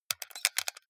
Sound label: console controller xbox play short fast